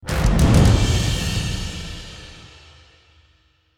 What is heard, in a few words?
Celebrate Unlock